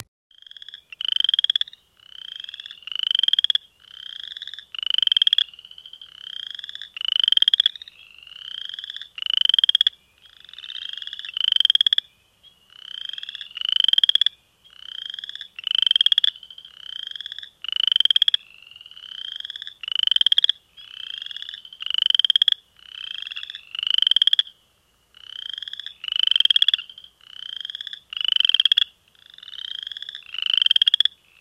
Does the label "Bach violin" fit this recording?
No